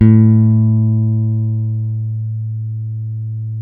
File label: A 2 JAZZ GTR; Univers Sons; Jazzistic